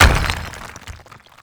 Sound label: medium impact stone; WAR